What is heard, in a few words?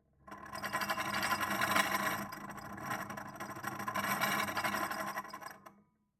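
china glass rattle, interior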